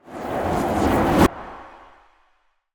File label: Ice Spell Mobility Teleportation Frost Blink; Mobility & Teleportations; Arctic Aura